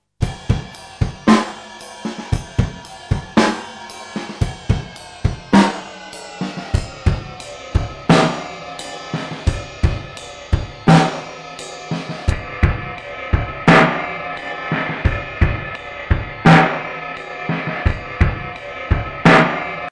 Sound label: drum pitch down